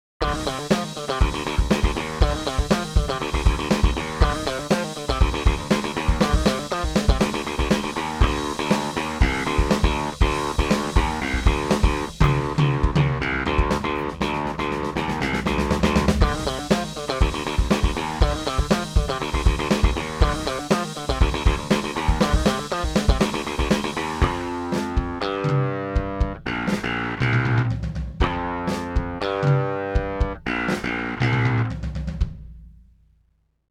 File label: bass